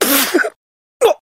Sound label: Pfft